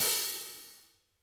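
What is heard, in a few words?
hats open, Interactive Drum Kits; Sonic Reality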